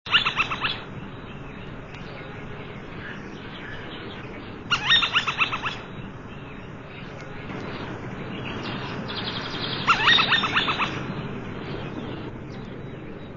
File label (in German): habicht